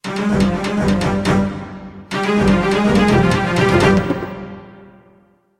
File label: Music Game Action